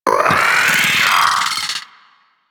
Amalgam Moa Vocal Attack; Warframe

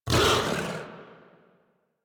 Feral Vocal Angry Attack Short; Enemies; Kubrow; Warframe

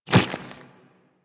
Infested Small Walk Dirt, Footsteps; Warframe

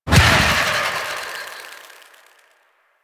Fairy Dust Explosion; DE; Warframe

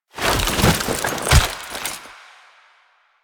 Stand To Prone, Foley; Lasrian Lancer; Warframe; Lasrian Soldiers; Stance

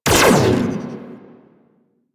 Burst Laser Pistol Prime Fire; DE; Warframe; Weapons; Sentinel

